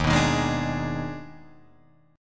Eb Major 7th Suspended 4th Sharp 5th, Guitar Drop D; down